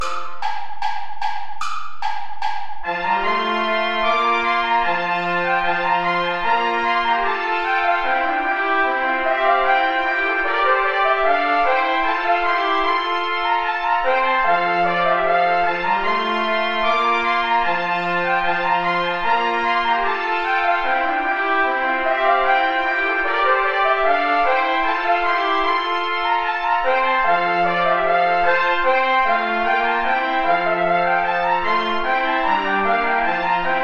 ballet play along without trumpet

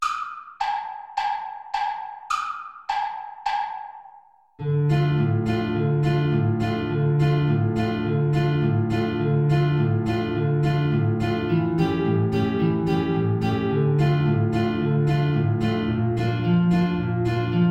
battare prosciutto play along; traditional

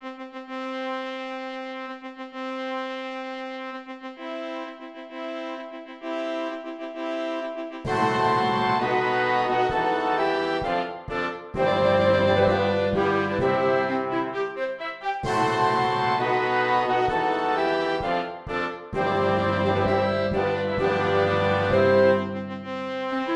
wedding march; mendelssohn bartholdy felix

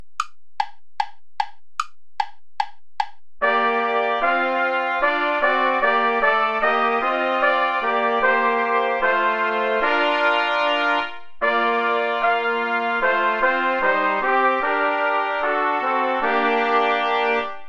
play along without trombone

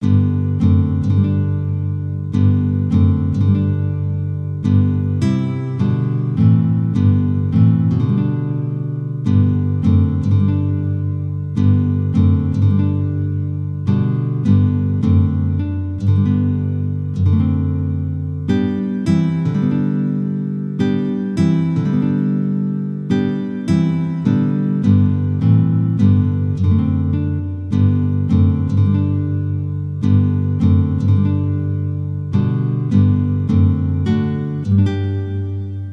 choral melody

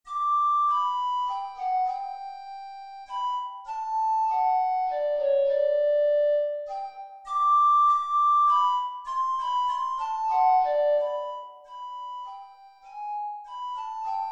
andante in g major; lewis alastair

